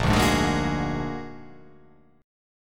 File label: A sharp Augmented 7th A sharp, guitar